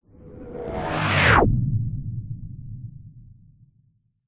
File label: science fiction spacecraft small zoom by pass hypersonic; zapsplat